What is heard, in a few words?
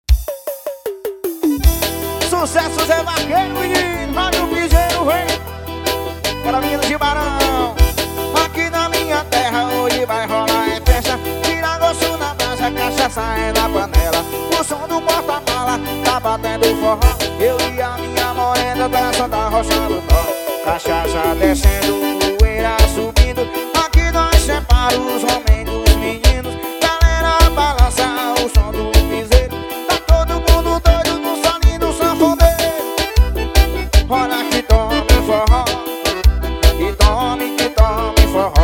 forro